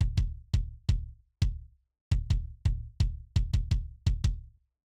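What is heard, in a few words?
BD tran dry, kick drum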